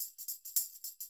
TAMB, Percussionworks

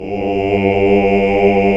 Basses

